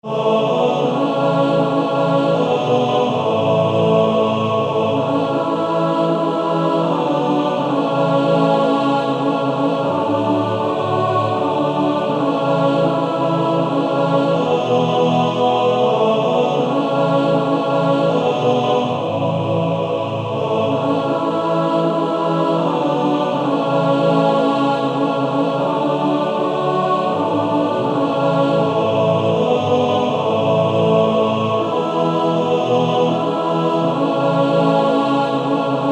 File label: Male Choir